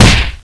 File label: baseball bat strike